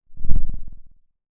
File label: pop